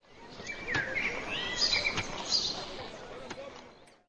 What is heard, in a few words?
housing; PHARAOH